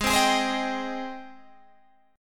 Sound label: Ab Major 7th Ab down Guitar Standard; AbM7 strummed; JGuitar